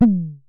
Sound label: Synare Filter; Star Instruments Synare 3